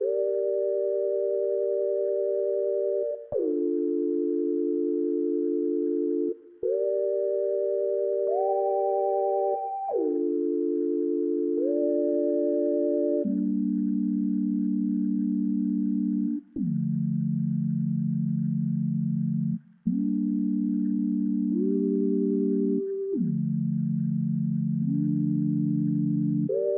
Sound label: 145 BPM D Min Synth Chords; Melodies; Loop Stems; Cymatics; 2022 Melody Mystery Expansion; Hidden Hills